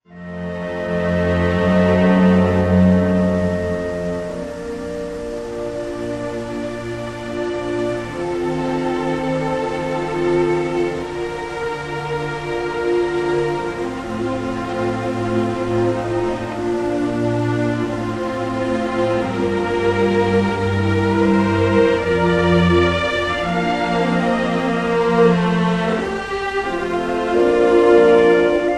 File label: Symphony in D minor, Adagio molto e cantabile; Symphony No. 9; Choral; Op. 125